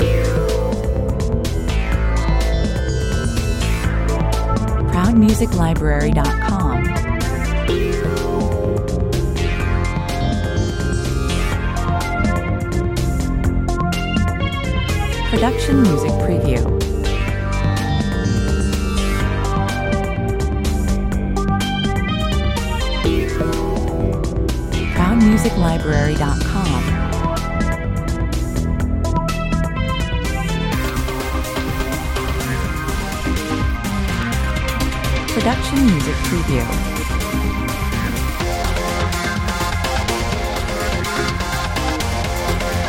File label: (talkover) 120 bpm